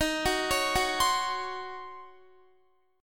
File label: Eb Minor 13th Eb down Guitar 4F,4A,5C,5G,5C,6E; Ebm13 arpeggiated; JGuitar